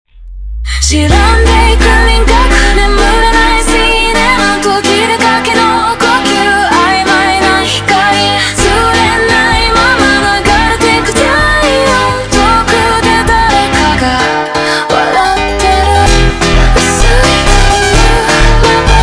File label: Montagem slowed